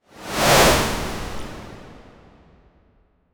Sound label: magical whoosh noise